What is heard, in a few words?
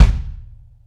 Bobo Kick, Kick One-Shots; KJ Sawka - Drum n Bass & Beyond; Drum Tool Box